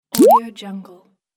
flip push

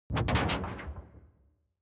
ui sci fi alien wobble slow

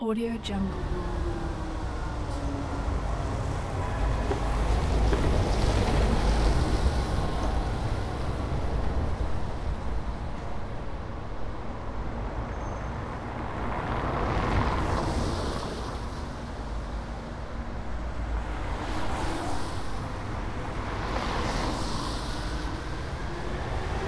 wet cobblestone road traffic

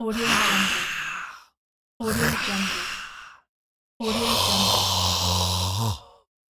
male zombie breath

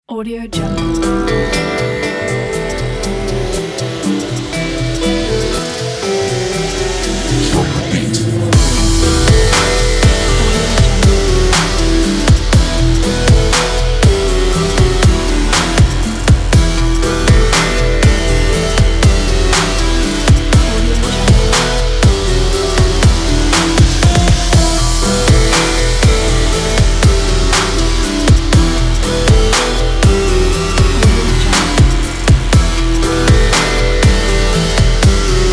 dark trap opener

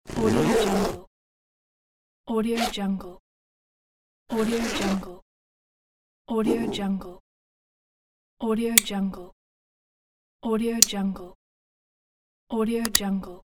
bag; teasound

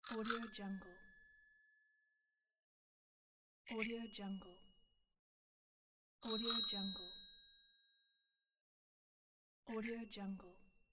bicycle ding

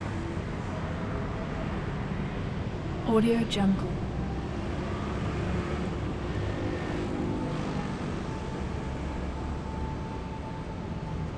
taipei traffic intersection busy rush hour

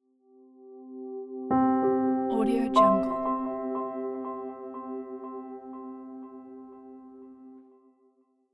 golden logo

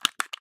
metal staple gun slider unlock button

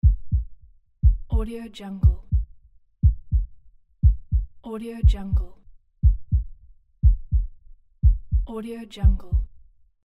heartbeat loop

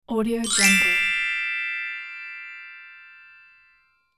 metal xylophone gliss up

